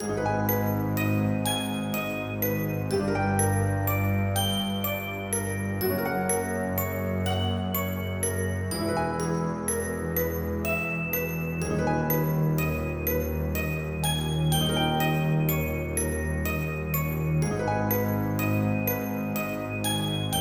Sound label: trance; magebuster amorous augury; android